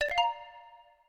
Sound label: Calendar Event